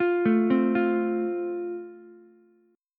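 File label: A Minor Sharp 5th, Ukulele, chord strum; Am#5 arpeggiated; JGuitar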